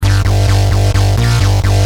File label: Driven, Bass; Industrial